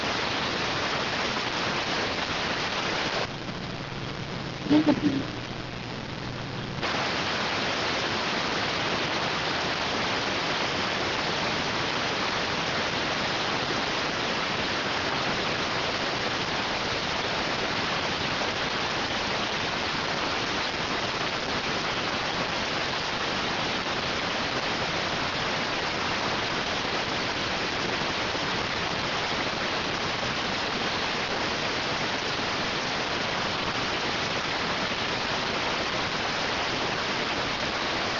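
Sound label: radioteletype receive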